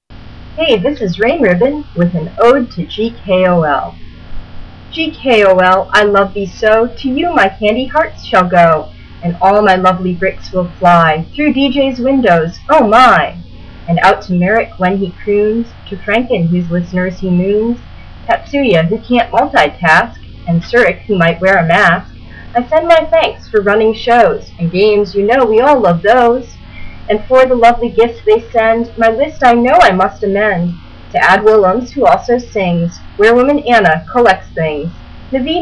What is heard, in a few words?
mega bump